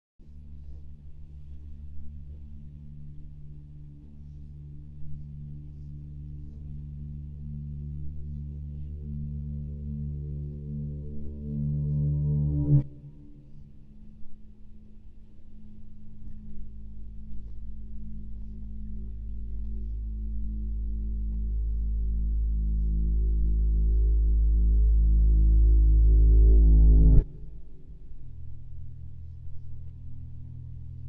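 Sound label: Bass Guitar Reversed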